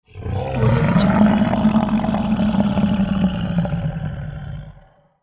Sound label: epic monster growl; floraphonic